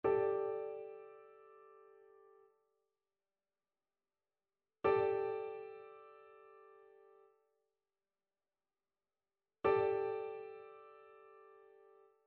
trichord

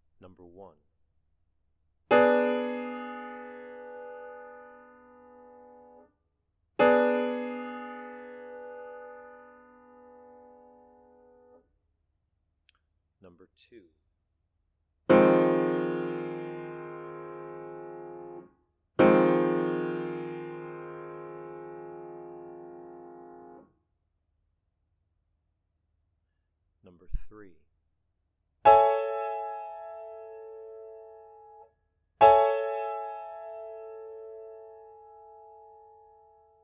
chords; eartraining

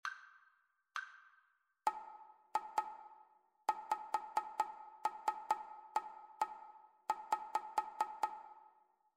rhythmic dictation

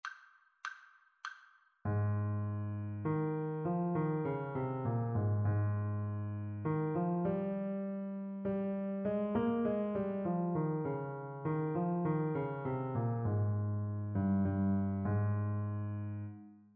partially completed melodic dictation; ear training

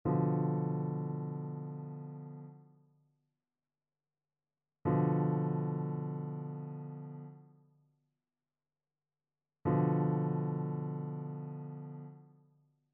trichord; trichord identification

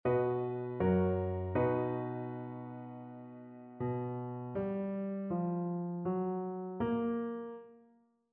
melodic fragments

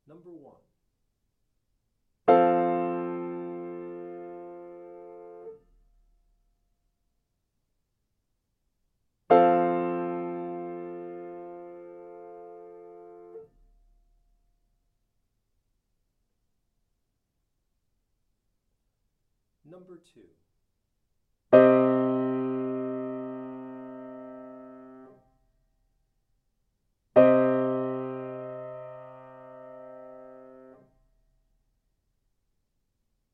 chords; eartraining

